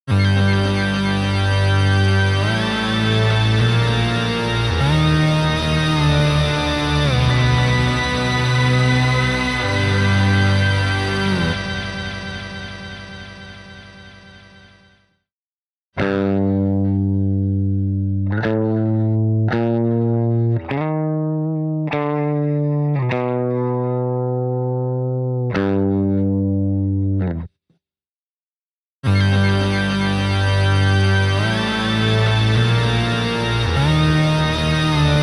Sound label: Guitar 80s; H90 Programs; Villain